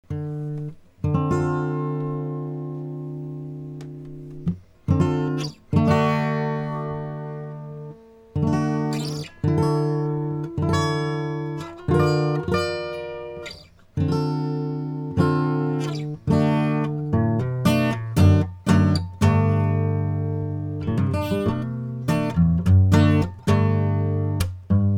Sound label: acoustic; red5 drumset; hardware